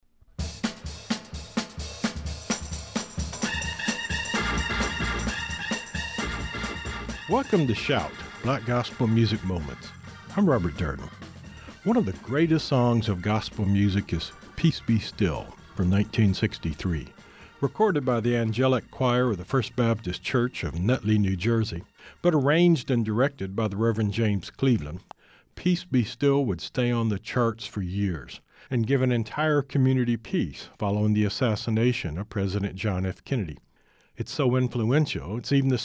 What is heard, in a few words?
angelic choir